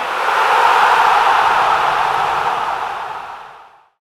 Match reward sound; Doodle Jump; 3DS, Android, Arcade, DS, iOS, Mobile, Xbox 360

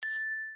Reward

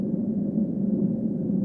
wind loop; Galidor: Defenders of the Outer Dimension

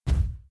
Capsulte Impact soft; Puddle; puddle plus ios gamerip 2018

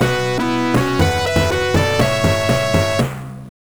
Celebration Jingle

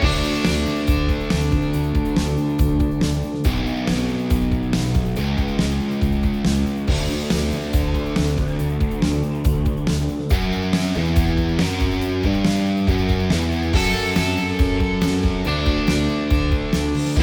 beat

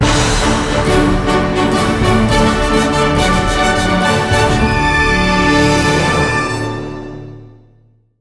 Jingle Success

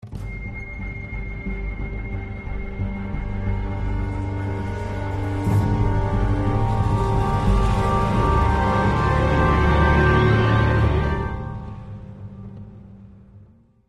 Linear Tense; the walking dead season two

